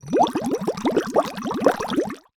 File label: food drink bubbles blown into glass through drinking straw